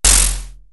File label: Snare, Drumfire DF-500